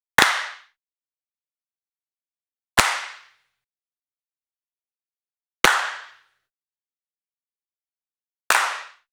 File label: Hand Claps Small Amb